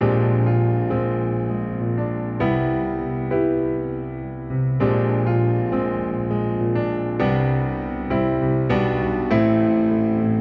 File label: Emotional Piano C 100